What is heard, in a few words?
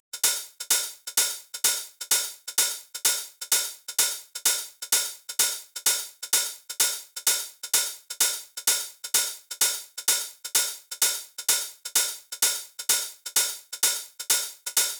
Top Loop - 128 BPM, Drum Loops; House; Pace; Cymatics